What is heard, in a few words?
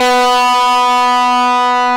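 B2 distortion guitar; AKAI S-Series CD-ROM Sound Library VOL-8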